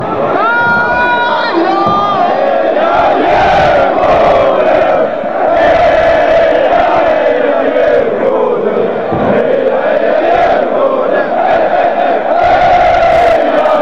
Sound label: chant; djurgardens if